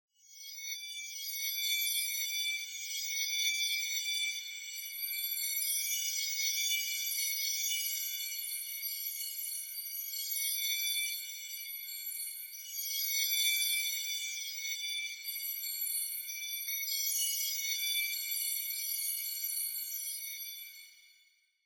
MAGIC GENERIC Texture, Shimmer, Processed Glass, Reversed Layer, Disturbing, High; Articulated Sounds; Magic Elements vol.2